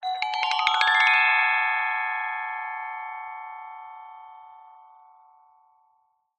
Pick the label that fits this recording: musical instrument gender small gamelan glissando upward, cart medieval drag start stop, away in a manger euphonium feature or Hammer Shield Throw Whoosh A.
musical instrument gender small gamelan glissando upward